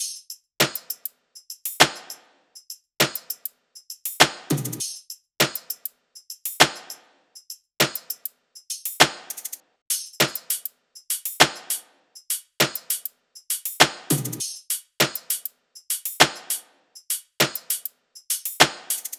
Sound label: loop top 100, Seismic Loops